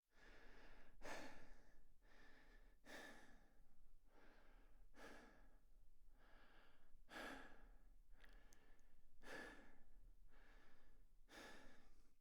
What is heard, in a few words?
human breathing mouth